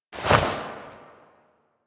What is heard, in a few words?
Move Foley Short; Warframe; The New War; Veso; Quests